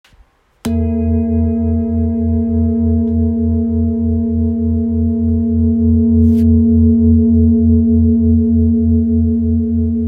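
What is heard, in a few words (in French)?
Bol ramgandi mi, bol chantant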